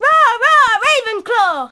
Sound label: Crowd